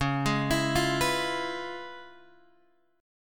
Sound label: C sharp 7th Sharp 9th Guitar Open G, chord